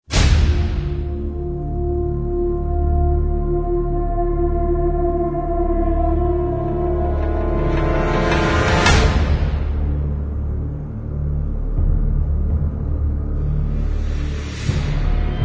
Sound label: Creatura No Choir